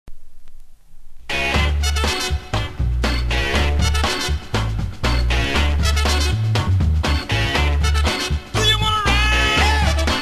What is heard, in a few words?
funky soul train